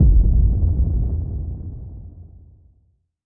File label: titan hit sub hit, weapons; kaiju; Stellaris; biogenesis